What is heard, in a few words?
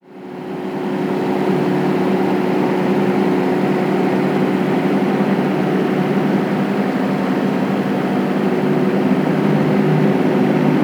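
air conditioner White Noise; AC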